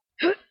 Grunt

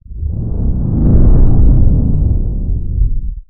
Ufo; Bymondfisch89

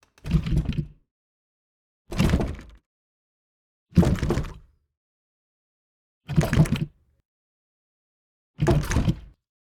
Closed Wooden Door

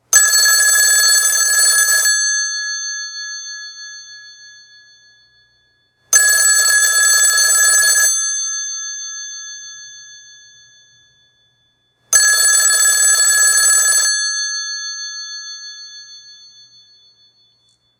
Loud 3 rings

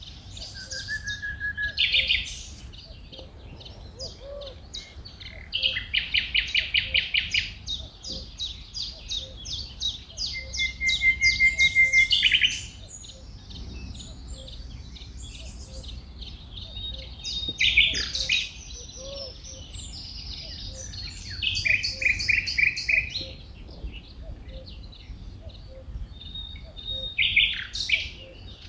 Nightingale Engelermeer

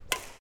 Badminton

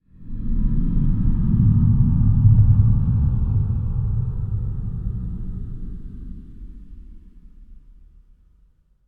Airplane Passes Out

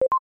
Confirm Beeps No Delay

